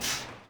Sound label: steam burst; vehicles